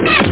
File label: spear gun